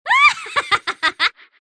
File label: laugh; dota; dark willow